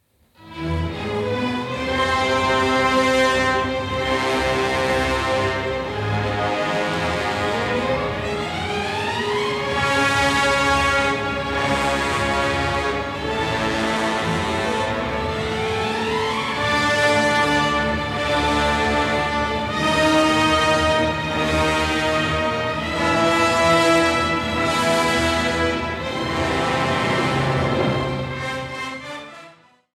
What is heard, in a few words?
Symphony No. 4 in E-flat Major IV. Finale. Bewegt doch nicht zu; Bruckner; Romantic